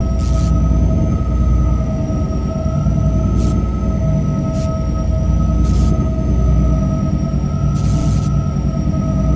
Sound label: Generator; ambience